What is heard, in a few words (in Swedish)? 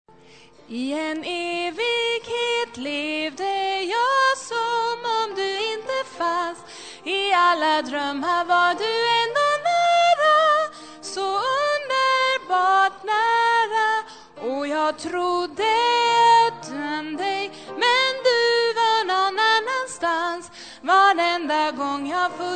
sopran